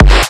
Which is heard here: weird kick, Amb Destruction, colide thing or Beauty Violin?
weird kick